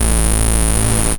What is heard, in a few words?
saw lead synth